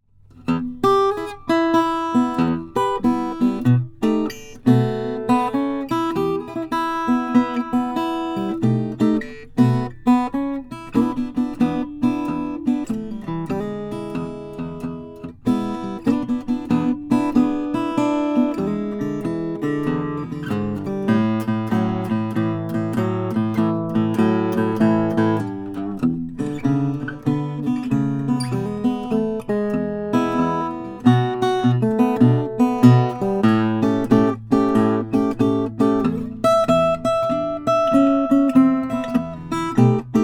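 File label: Wingert cowboy parlor Brazilian rosewood; kathy wingert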